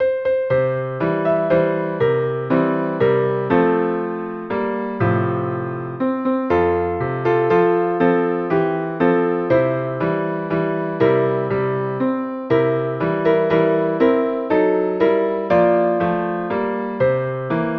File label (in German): Klavier